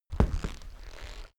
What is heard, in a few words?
step wood step